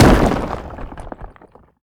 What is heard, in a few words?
wall rocks; Golden Crayon Sounds